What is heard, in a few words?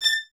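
Violins A 6 staccato right; Miroslav Vitous; String Ensembles; 11 Violins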